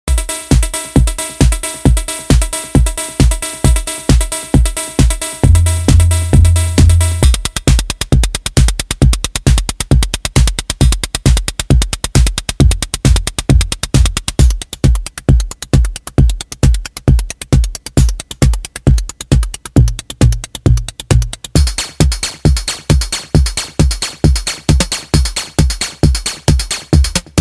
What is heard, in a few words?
drumsets